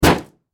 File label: Car Crash; teststrecke-game